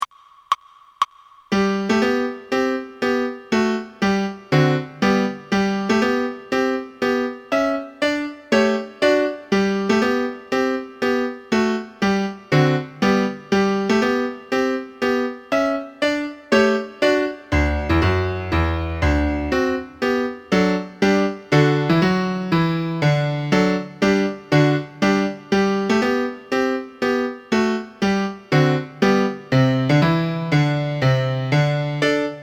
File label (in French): marche des soldats acct 120, basson